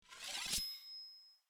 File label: Draw Sword; soundscrate